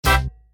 bonus chance; Red Chilli

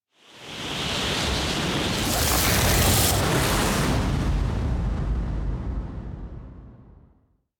tornado; Wild Guns

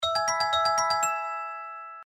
celebrate; Red Riding Hood

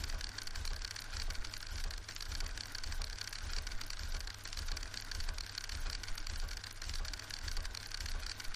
reel spin; Racetrack Riches; megaboard

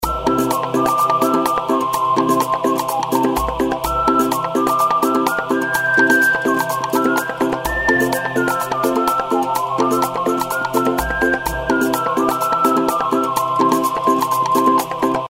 polynesian intro bgm

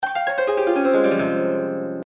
lose; Four Beauties

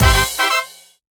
bonus chance; Red Chilli

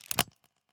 spin start; Wild Guns

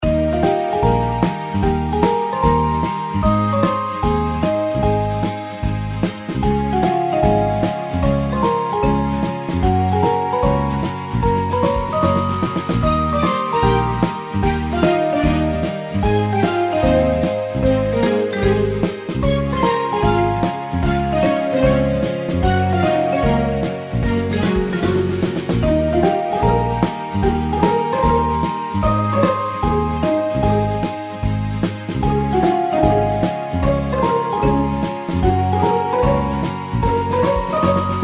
reelspin fg; Jelly Mania

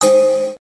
bonus chance; Book Of Fortune

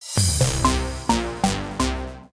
start; Hot Fruits 40

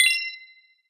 gambler round up; Fruit Fiesta; Wazdan

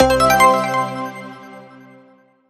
multiline win; Magic Stars 5; Wazdan

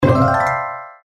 bonus; Fairy Dust

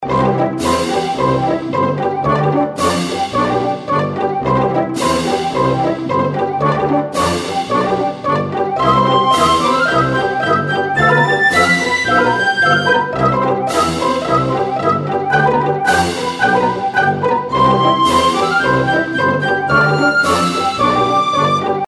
intro bgm; Pinocchio

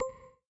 service bet; Mighty Rex

